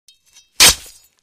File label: The sword hits the body; cold weapons